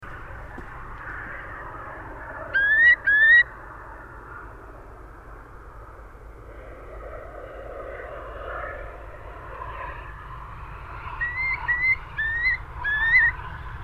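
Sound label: Eurasian curlew voice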